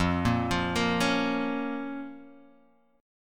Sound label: F Suspended 4th Sharp 5th F down; arpeggiated; JGuitar